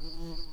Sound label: bumblebees, Lucorum queens